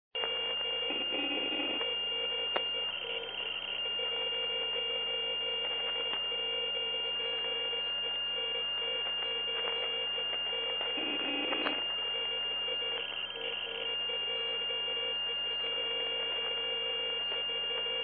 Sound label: 136 KHZ